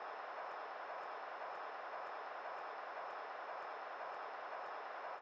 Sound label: reel Spin Autoplay; fruitshop